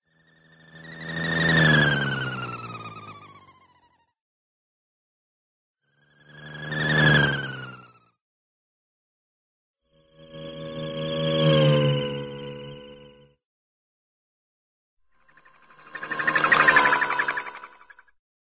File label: sci fi space capsule pass by; cartoon; jetsons